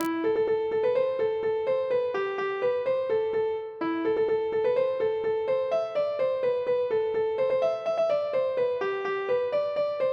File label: polka; boeuf trad